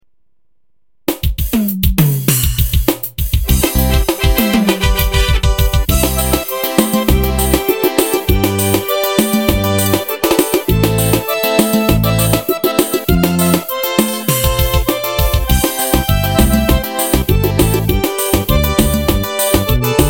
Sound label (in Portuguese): sertanejo e forro